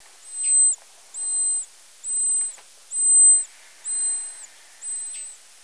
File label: Rhinolophus ferrumequinum